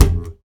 high tom single hit f key, Royality free tom drum one shot tuned to the F note; tom drum samples